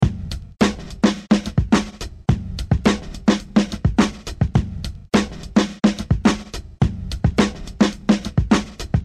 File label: Chembeat Tape Body